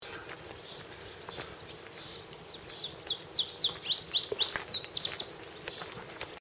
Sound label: Iberian full song with trill; Iberian Chiffchaff Phylloscopus ibericus